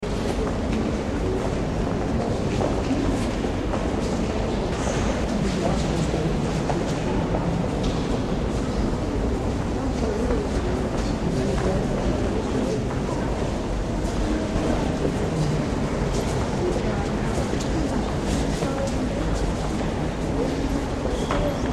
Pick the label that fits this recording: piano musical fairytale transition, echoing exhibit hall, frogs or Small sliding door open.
echoing exhibit hall